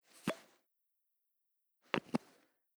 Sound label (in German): Parfuemflasche oeffnen schliessen; Parfümflakon